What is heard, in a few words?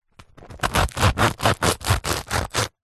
food bread loaf crispy paine de casa cut with bread knife